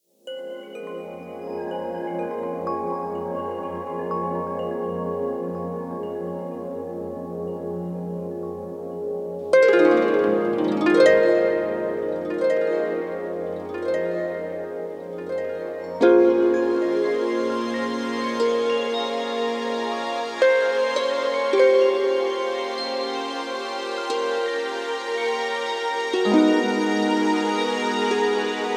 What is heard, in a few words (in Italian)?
Arpègiatura vibrante; Pure Vibration; axiel-son